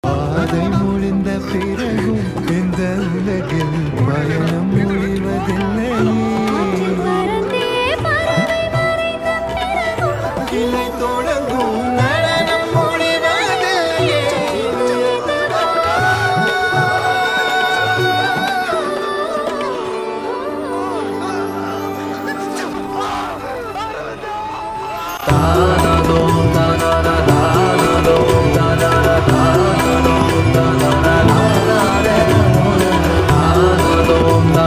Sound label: song